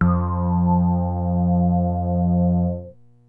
DEEP BASS; Kid Nepro; Elite

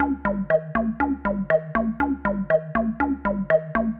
SH101 Bass Loop (120BPM), retro house

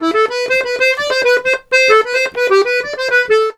accordion, Complete Accordions; USB Soundscan vol.40; AKAI

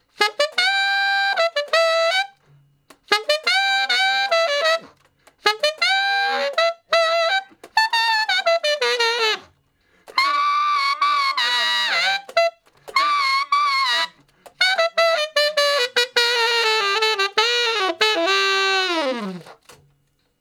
Sax Shuffle (E); Solos; Ten Sax

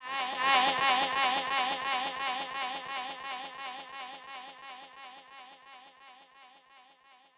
Fx Vox Loop 130; Equipped Music; Loungin House

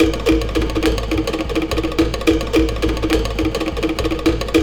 WAR DRUMS; Zero G - Ethnic

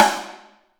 SNARE, WET SNARE; AKAI S6000 CD-ROM - Volume 3

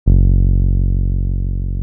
TB 303

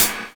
CLEAR BEA; Univers Sons - Kitch 70s

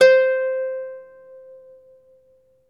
CELTIC HA, Celtic Harp

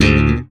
bass slap; Xsample VOL-1; SLAP+THUMB

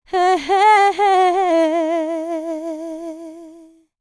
LICKS, Vocal, R&B; ILIO; Dance; LT R+B

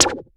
RESO SN, Snare; AKAI S6000 CD-ROM - Volume 4

